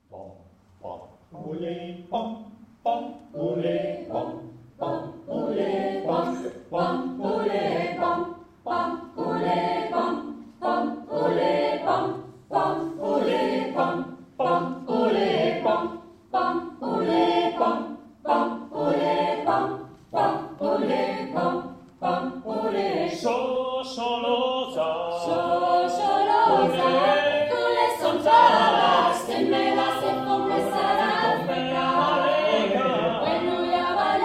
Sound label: Afrikan Trad. Shosholoza Chor